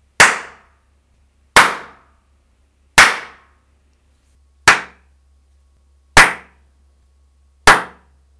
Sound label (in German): Sprachaufnahmen Schallabsorbern Klatschen